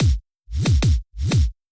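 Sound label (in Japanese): Kick, キックの高域をそのままリバース; リバースキック; 高域にハリがある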